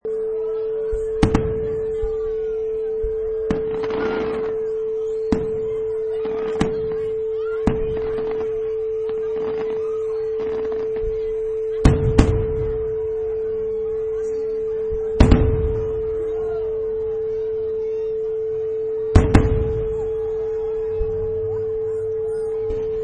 Firework Display

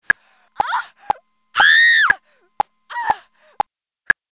scream

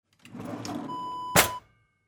drawer kitchen open

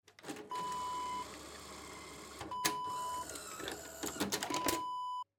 vcr tape eject

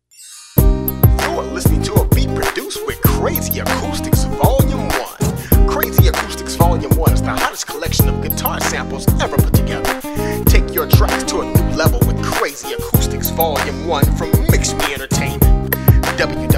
Crazy Acoustics; Lookz

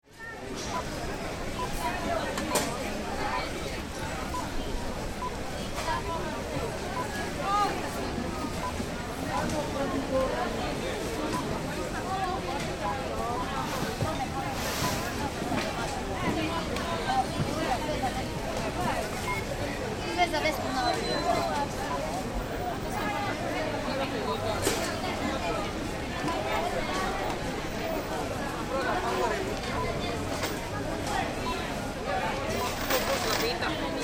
Croatia Fruit Veg Market

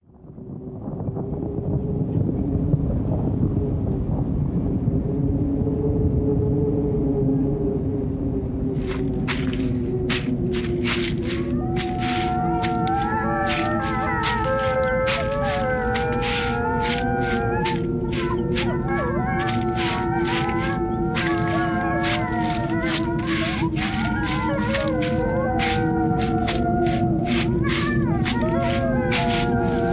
Halloween Spooky Sounds

